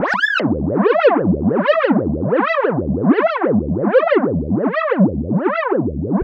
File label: Noise, LFO & Noise